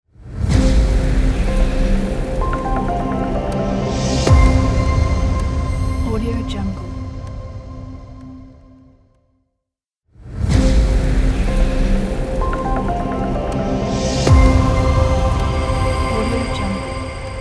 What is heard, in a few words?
explosion logo ident corporate intro